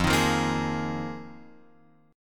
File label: F Minor 11th Guitar Open F; Fm11; strummed; JGuitar